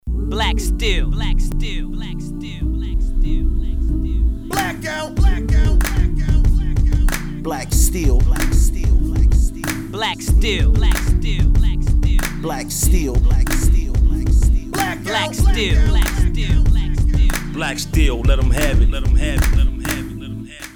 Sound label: Oooh